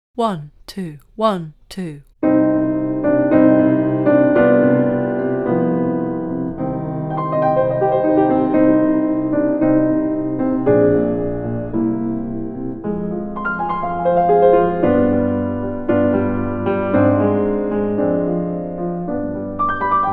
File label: Brahms Hungarian Dance No. 1 backing